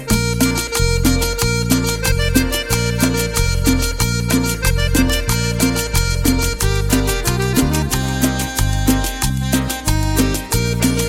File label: Cumbia Mix